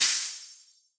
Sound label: shaker burst; Skillzgaming; fruit Blast Desktop